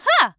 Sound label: yah wah hoo; pauline; SM64 CO-OP